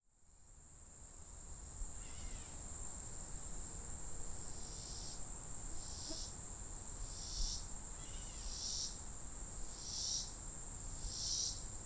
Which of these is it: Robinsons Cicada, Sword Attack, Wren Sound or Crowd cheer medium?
Robinsons Cicada